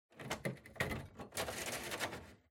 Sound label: Air Conditioner (Filter Remove)